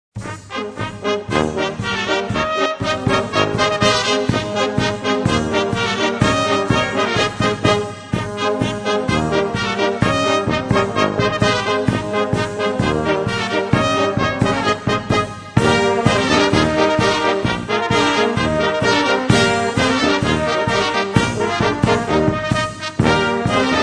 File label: retro polka